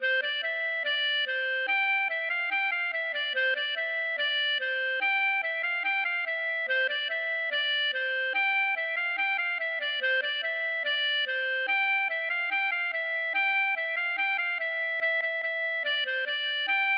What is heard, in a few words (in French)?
laride